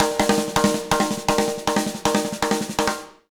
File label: BEAT, Jazz Latin Drumloops